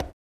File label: CONGA; E-Lab; X Static Goldmine 2